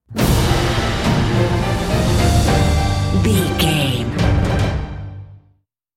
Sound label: epic cinematic sting bumper